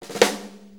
Blues Snare Flam; Sonic Foundry (Sony Creative Software); Loops & Samples Drum Tools; Flams

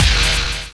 Fat STAB long; Urban Shakedown

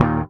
SHRT D#, Bass; Sampleheads; Will Lee Bass Library

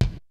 KICK SNARE; Kid Nepro; Elite; KICKS SNARES

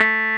Roxichord, E.Piano

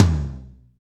TOM TOM; Real-Kit s12

